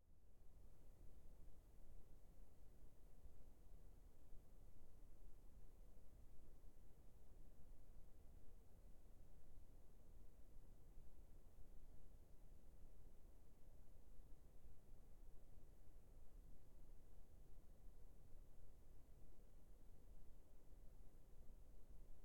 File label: Car Ford Fusion Petrol 1.6 Interior Atmos Engine Off Countryside; Stereo Sennheiser ME2 Spaced Pair; Submerged Tapes; Ford Fusion Plus Ambisonics